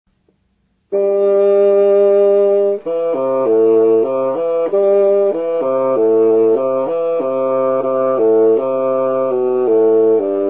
Basson; bassoon basics; winds; wood

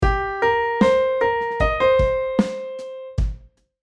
piano and keyboard blues licks; 10 cool piano and keyboard blues licks

